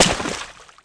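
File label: player water splash; firearms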